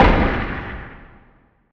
asteroid damage oxide big; Factorio

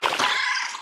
wriggler warcry, enemies; Factorio

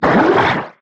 strafer warcry big, enemies; Factorio

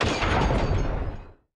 tesla turret rotation stop; Factorio

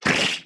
wriggler attack, enemies; Factorio

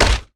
axe mining; factorio; teflilly

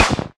asteroid damage metallic medium; Factorio

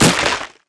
mined lichen tree, mining; ashland; Factorio

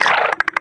wriggler idle, enemies; Factorio

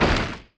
asteroid collision carbonic small; Factorio

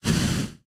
thruster inventory move; Factorio

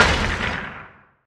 asteroid damage oxide medium; Factorio

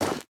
warm stone, walking; Factorio

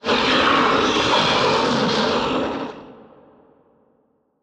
demolisher roar, enemies; Factorio

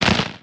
asteroid damage small; Factorio

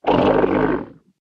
stomper idle, enemies; Factorio

